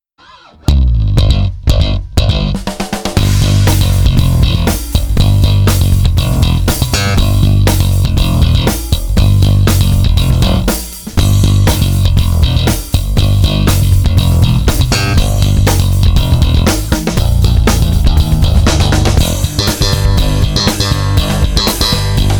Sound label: me-20b patch; Slap sound, good for funk rock.; ME-20B Bank 7, No. 1